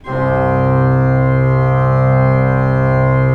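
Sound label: ORG C2, KEYS; Synclavier Sampler Library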